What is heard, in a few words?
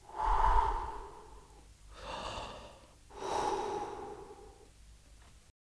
Blow